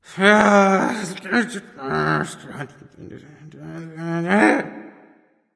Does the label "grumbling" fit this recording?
Yes